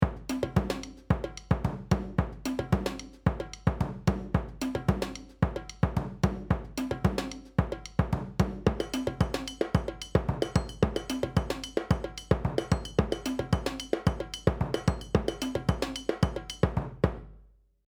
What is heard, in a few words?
perc; fxpansion; BFD